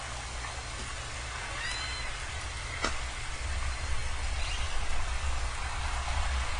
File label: S40 call chirp, SRKWs; acoustic data; J34